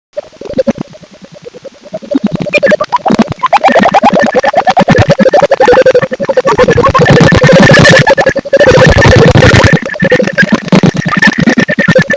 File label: Lesser Horseshoe, bat